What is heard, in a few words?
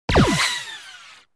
romulan torpedo